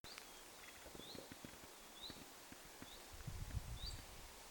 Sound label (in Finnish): mika laji kaksi tuollaista sarja-aanta pensaikosta rannikolla